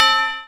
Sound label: DIDI, SYNTHESIZER, DIGI WAVE; AKAI S6000 CD-ROM - Volume 1